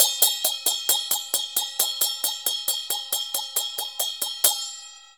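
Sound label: CHINA SM13 R, China cymbal; Spectrasonics - Heart of Asia CD2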